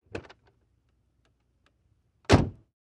VEHICLES - AUTO ACCESSORIES: Old automobile door open and close.; accessories doors